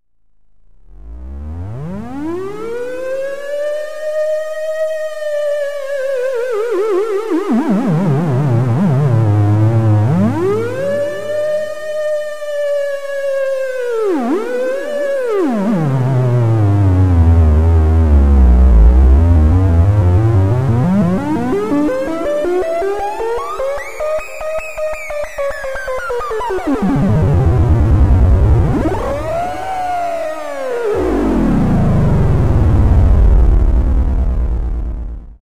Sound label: theremin